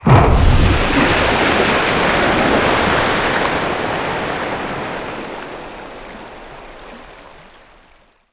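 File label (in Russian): мина плавучая взорвалась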